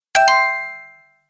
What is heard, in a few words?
Electro, notifications; BlindShell; BlindShell-Classic-2